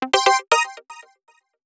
alert; Sony Ericsson; SE Sound Project; WaFam 1 Jinx

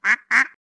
Duck, notifications; Itel; Power 80

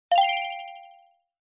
Arrive, notifications

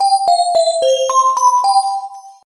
Posh bell, Ring signals; Sony Ericsson P900

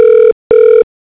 ringback short; Asus; P565

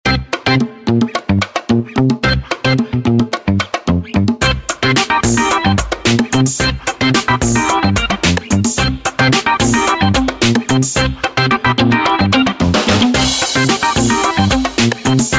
Funky, ringtones; SU760 Optimus 3D; LG

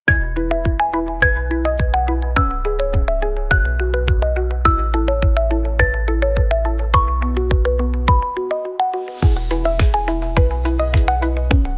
Grille, alarms; Tecno Spark 40C

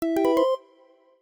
Magic Bell, notifications; ZTE Nubia RedMagic

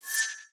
Unlock; Asus ROG Phone 6

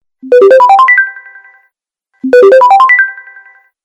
Modern Ring; GX500; LG

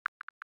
Folder add open; Samsung; GT-S8600 Wave III; EffectTone

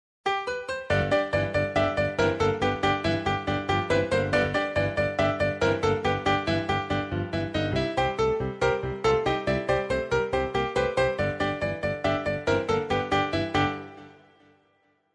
Melody, Ring; Doro Liberto 820 Mini

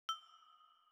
Mouse, notifications; Motorola; Moto G20

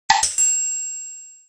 Alert Filler; Samsung SGH-i617 BlackJack II

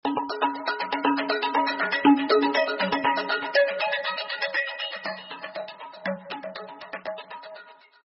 Ringtone; BQ; Russia; BQM-1818 Dublin

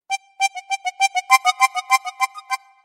Tango In Love, notifications; Micromax; Bolt-Warrior-1-Plus-Q4101

